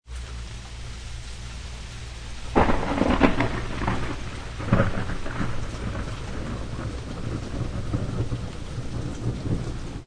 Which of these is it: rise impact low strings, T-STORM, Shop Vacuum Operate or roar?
T-STORM